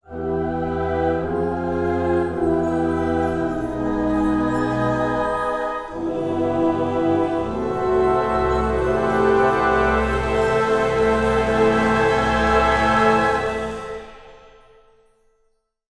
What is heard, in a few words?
game end